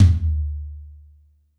G2 - Room Tom, Yamaha PSR-E323 Room Kit

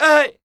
Flamenco, Vocals, Male

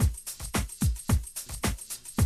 110, Classic Drum Loops

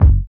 RB 100 BD -L, Hip Hop R_n B; USB Soundscan vol.19; AKAI

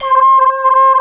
OB, OB modular; Greytsounds VOL-1

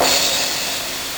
LSDj Kit of Tom, Nintendo Gameboy; 300 Drum Machines

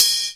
CYM, Dance Drums

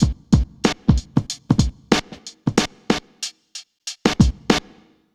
Drums, Urban Grooves; Sonic Foundry (Sony Creative Software); Stylus Pressure Urban Grooves on Digital Wax; Summer Jam

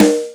Yamaha CS6, Drums

